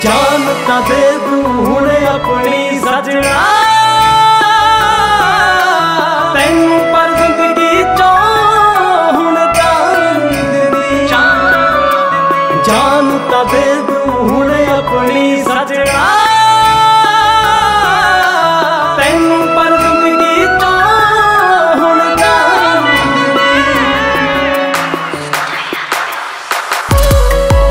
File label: Sad Punjabi New Song Ringtone